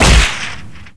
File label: whip crack; Gamestar